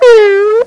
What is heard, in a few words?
Meow; Halloween Night II